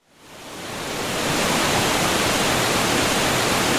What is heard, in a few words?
waterfall; theGoonies; Retro